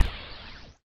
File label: ELECTRO BLADE CANON, lasers